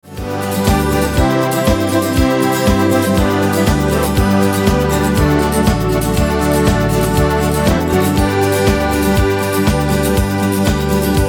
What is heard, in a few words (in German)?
Karaoke